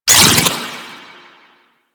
Prism Laser Hit; Warframe; Warframes; Harlequin

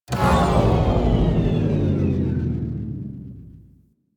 Deceleration, Vehicles, AlternateEngines; Warframe; RailJack; RailJackShip; Zekti

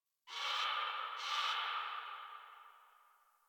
Tree Distant Critters Happy Calm, Ambience; Warframe; Duviri

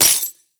Fist Claw Coin Hit; Warframe; Tenno; Incarnon

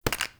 Acorn Pickup; Objects

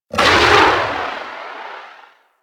Artillery Barrage Explosion Far; Weapons; Powers; Warframe; Archwing

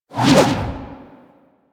Melee Charge Swing Whoosh; Warframe

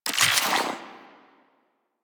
Infuse Radiation UI; Warframe; Warframes; Alchemist

